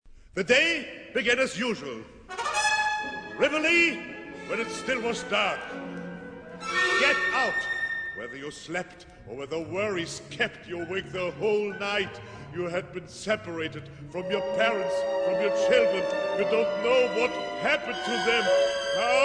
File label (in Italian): atonalita